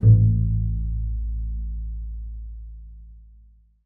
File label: A1, bass pizz; Xsample VOL-5